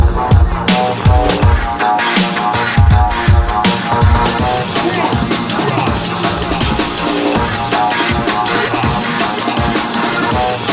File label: scary ringtone